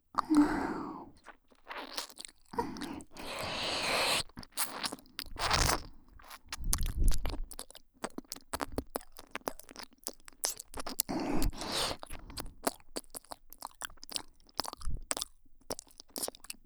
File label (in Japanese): 耳舐め＆オイル手コキループトラック, ASMR